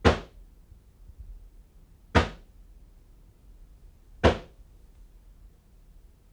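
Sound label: 3 hits drum courtyard rec halfway up stairs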